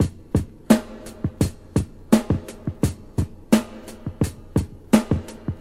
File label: 86 bpm modern drum loop f key, kick tuned to the F note